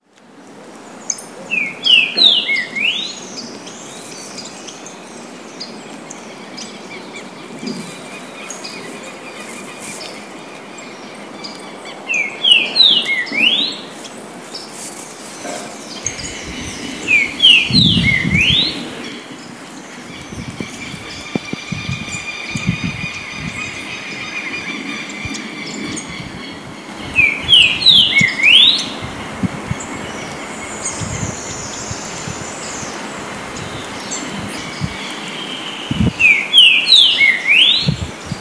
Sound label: Saltator similis; Pq Caracol; Canela RS; Silveira